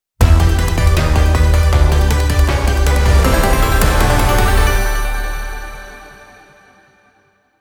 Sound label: Transition